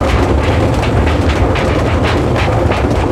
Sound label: Rocket dirty; foley